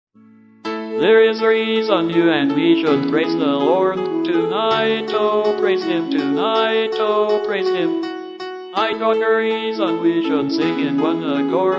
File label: PIANO VERSION